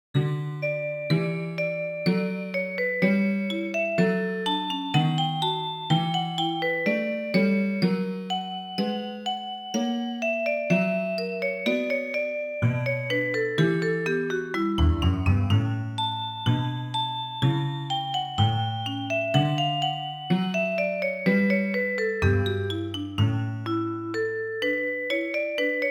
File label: vibraphone marimba dulcimer; Landstalker